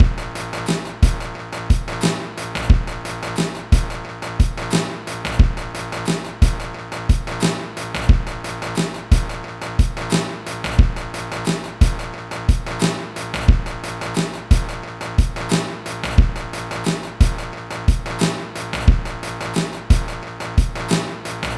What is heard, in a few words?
Rock Disco